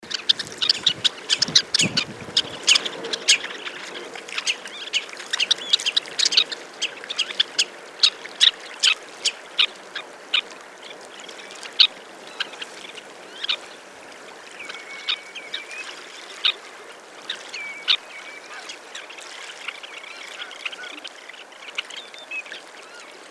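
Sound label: red-necked phalarope voice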